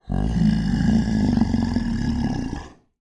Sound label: growl monster roar; the library by mtc; Sonniss GDC